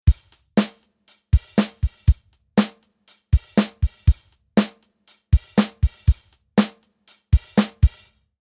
Kit, Drums

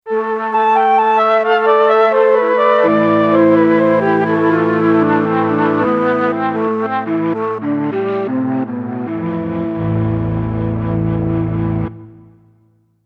Mellotron Flutes; PC3

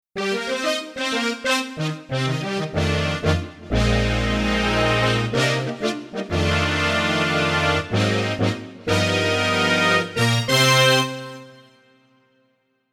Power Pop Horns; PC3

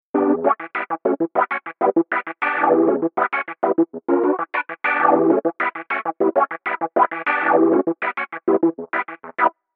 70s Wah Leslie EP; PC3

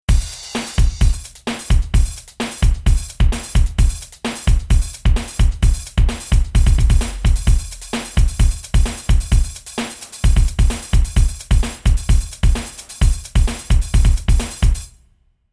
Dance, Drums; Dance-Marilyn; PC3

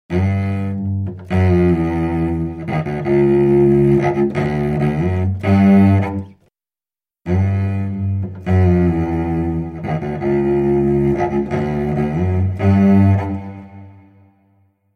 cello melody

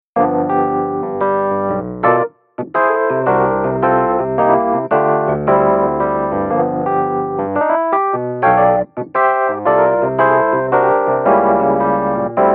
Classic Wurly; SP4; E Piano

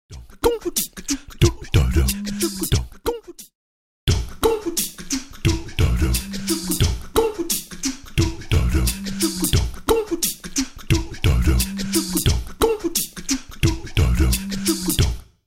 Vocal perc loop

